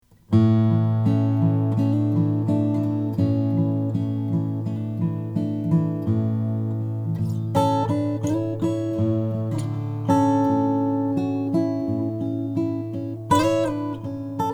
nightingale soloist cocobolo

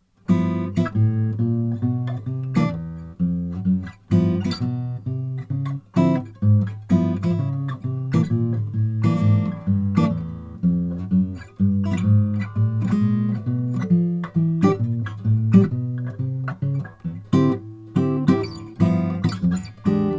sj brazilian rosewood, guitars; olson

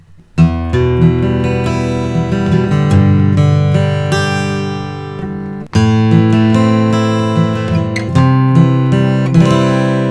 martin custom ds 12 fret ss adiron; adirondack

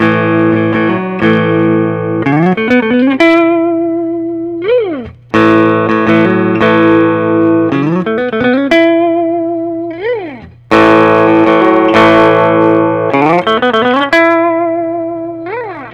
gibson historic chambered 58 les paul

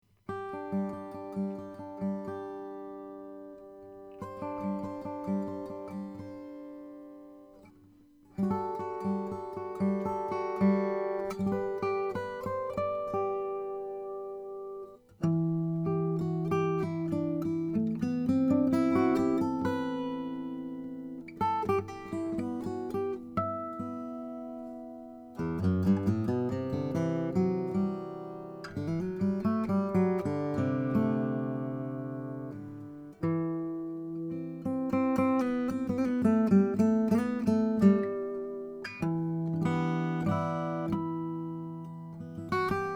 greven f custom deluxe myrtlewood; 1993

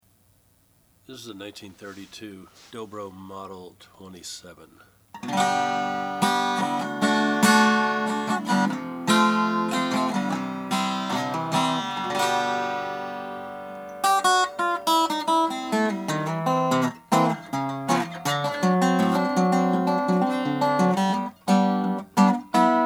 dobro model 27 black

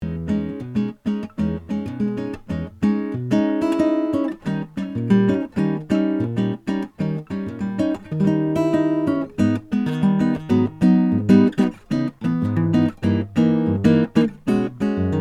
maingard guitar brazilian; 2008

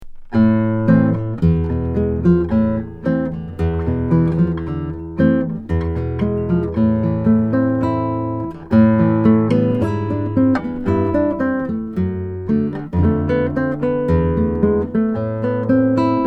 classical french polish; kathy wingert

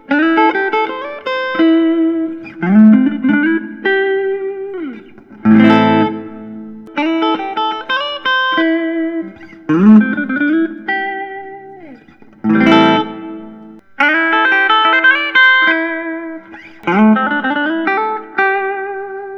bluesmaster 59 chambered lemon burst; johan gustavsson

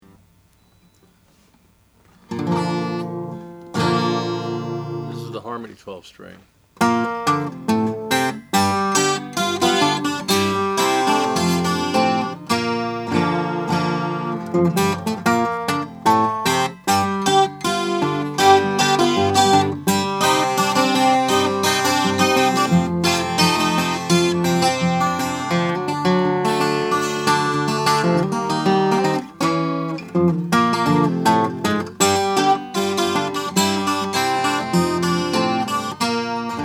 harmony h1270 natural

